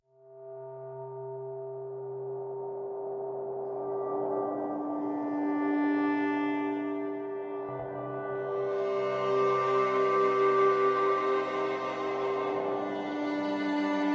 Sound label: Sad Coast Underscore